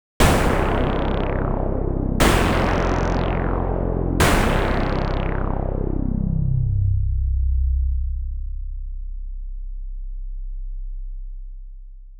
Synth; プロの音プロの技・令和版

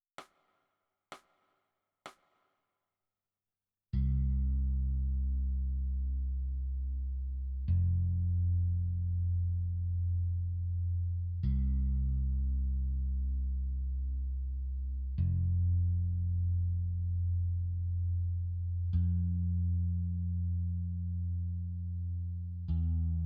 Bass

